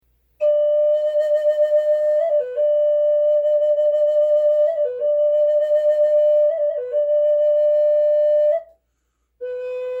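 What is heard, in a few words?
natural, wood flute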